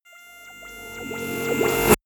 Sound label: rise buzz high